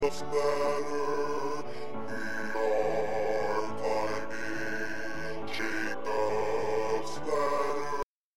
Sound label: Bass